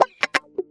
Stabz

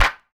Snares

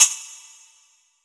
Hat Closer; Closed Hats

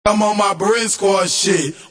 Vocal, Southside Vox

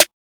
Snare; The Lunch77 Sound Design Mini Kit (Vol. 1)

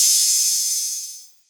cymbal

